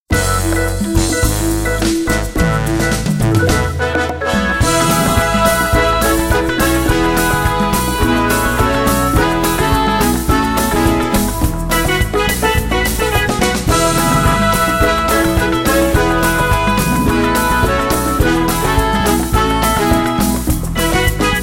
Bows Exit Music

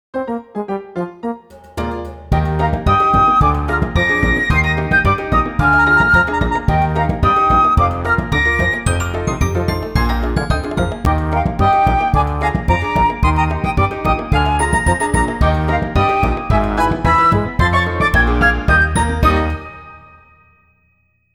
Jumps Solo B

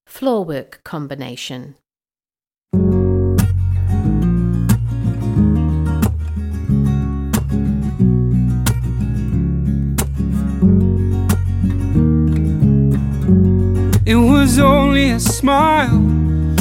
Floor Work Combination

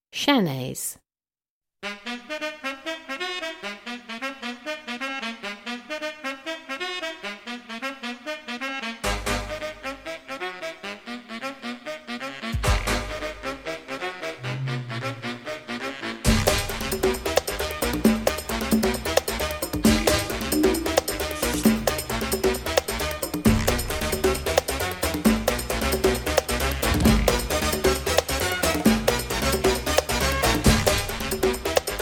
Chaines

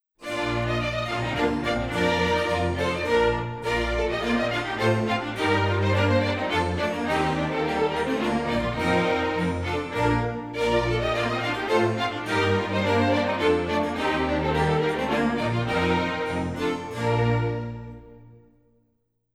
Springs Solo Hornpipe; Abdelazer

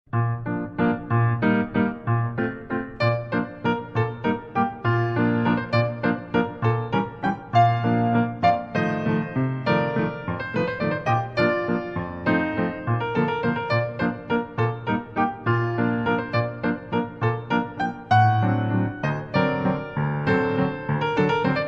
Classical Dance Female

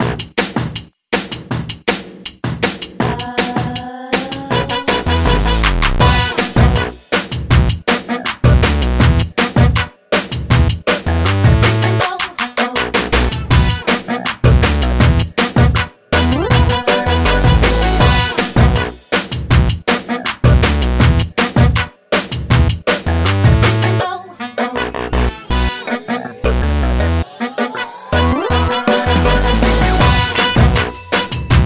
Rhythm Rota Music

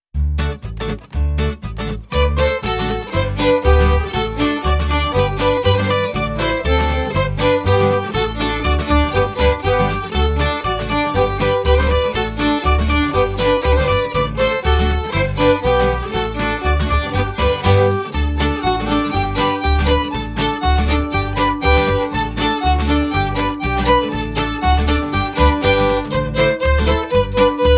Springs Continuous

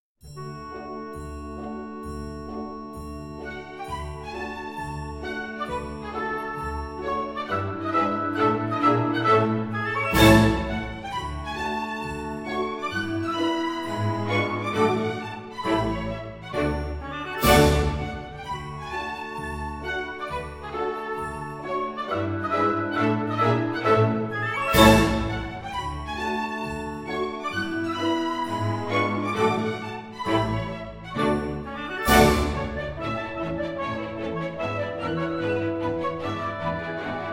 Dance B Peasant Pas De Deux Male Variation; Giselle

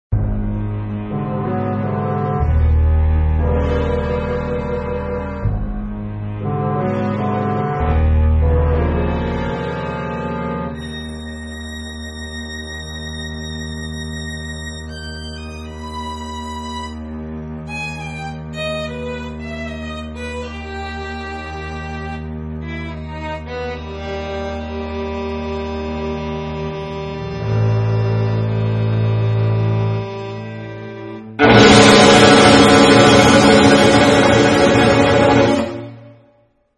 Grandmothers House INST

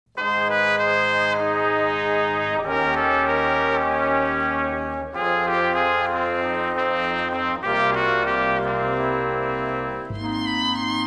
Radio Broadcast Booth Transition

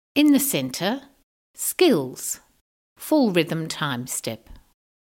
Full Rhythm Time Step

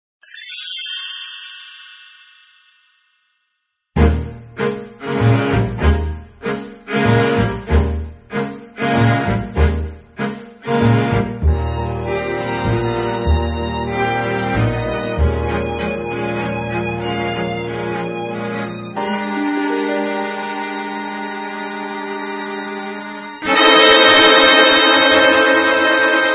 Underscore INST

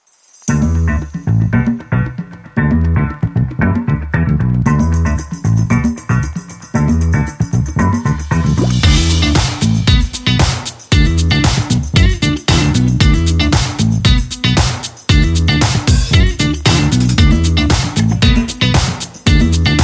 Full Rhythm Time Step Music

